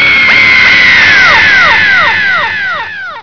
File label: scream